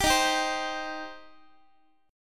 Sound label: D sharp 6th, Guitar; strummed; D#6; x,x,10,13,11,11; JGuitar